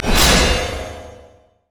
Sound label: battle roar armor buff; rengar